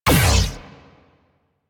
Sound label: Gatling Laser Shot; Railjack